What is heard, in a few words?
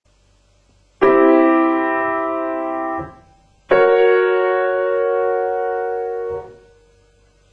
pedal